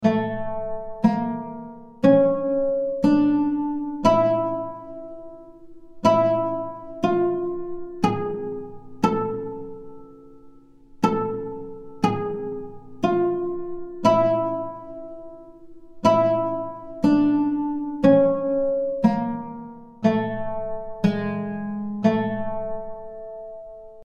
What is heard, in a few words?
ud zirgüle